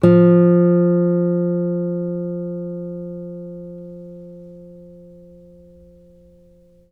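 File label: acoustic finger F 2, guitar; East West; Steve Stevens Guitar Samples Collection; ACOUSTIC FNG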